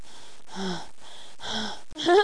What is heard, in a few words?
STARTUP; Girlfriend Tracy